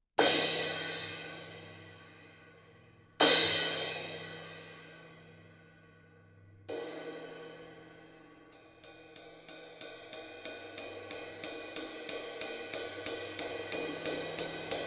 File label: 22 Sabian HH